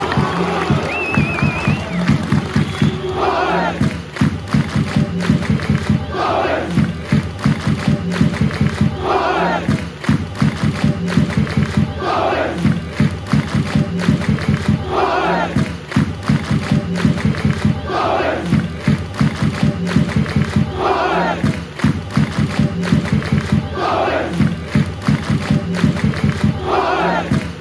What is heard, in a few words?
clap bohs, football songs and soccer chants